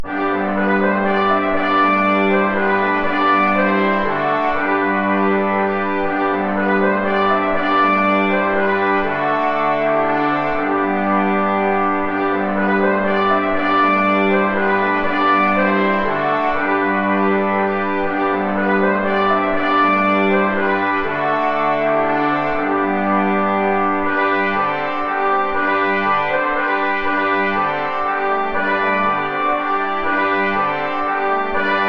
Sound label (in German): Saltarello (3 Trompeten in C & 2 Posaunen)